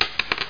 coin

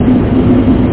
TANK; ASSAULT

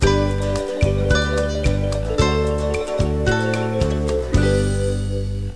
Sound DANCE; My Science Fair

